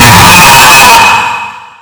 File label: girls screamer